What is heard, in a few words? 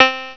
Banjo; If Monks had Macs; MacCube Volume 5: Home Applications